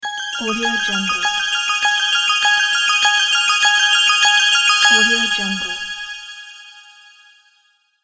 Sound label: christmas intro